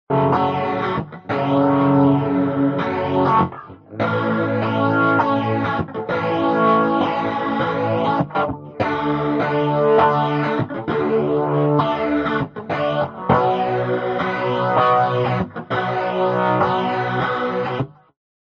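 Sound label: quantized voice guitar wet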